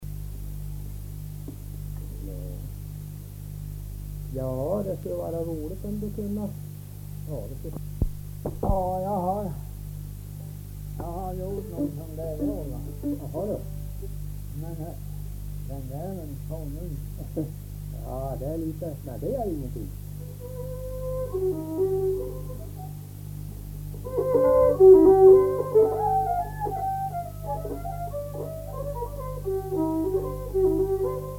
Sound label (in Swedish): Polska